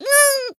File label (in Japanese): Voice damaged jp; saki